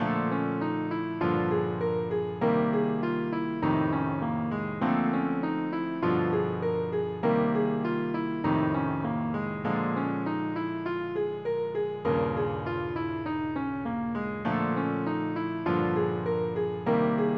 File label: rhythm changes blues scale